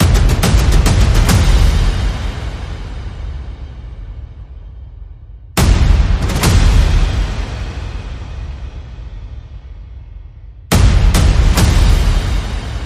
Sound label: Percussion and Effects